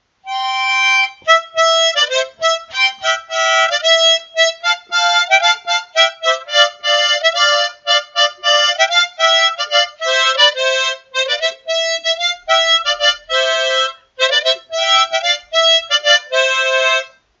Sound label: harmonica